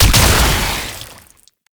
Techrot Conjunction Explosion; Gameplay; Warframe; Nineteeen Ninety Nine; Deep Archimedia

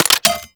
Laser Aim Rifle Mech; Warframe; Grineer

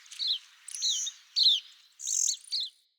Wetland Forest Day Bird Close; Midrath Island; Ambience

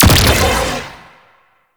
Primary Shot, Weapons; Warframe; Cephalon

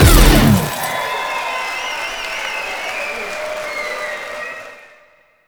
On Beat Solo; Warframe; Temple

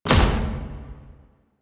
Crewship Missile Swarm Mech; Vehicles; Crew Ships; Weapons; Warframe; Rail Jack; Corpus Crew Ship

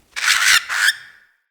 Amb Corrupted Bird Close; Ambience; Mendicant Area

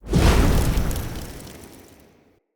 Short Sword Fire Blade Impact; Weapons; Elemental

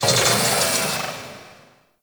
Distant Machines; Ambience; Warframe; Corpus Railjack

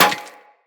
Bullet Impact Generic Metal; Weapons; Surface

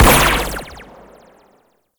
Laser Fire; Amalgam Carrus; Abilities

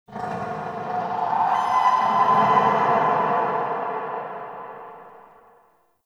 Growly Overtone; Ambience; Warframe; Shadowgrapher

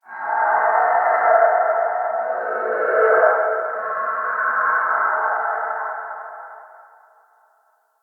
Signature Call; Ambience; Corrupted Ode Three

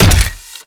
Step; Warframe; Corpus; Arachnoid Wraith; Steps

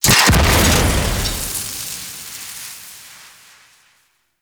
barrel gas explosion; Warframe; Corpus Gas City; Gameplay Remaster; Ambience

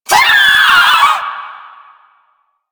Volatile Runner Kamikaze Scream; Enemies; Abilities; Warframe; Proto Infested

